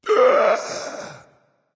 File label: nv breath, vox; smite; Vulcan